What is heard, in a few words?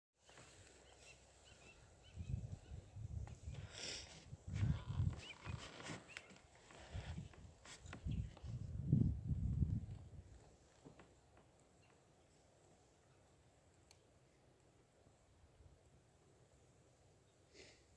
Northern Harrier